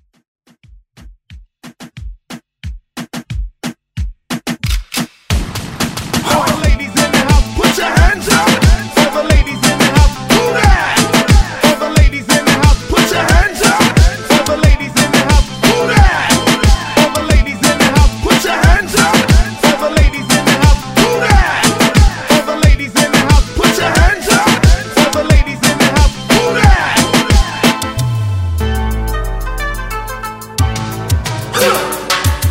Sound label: Reggaeton Hype Edit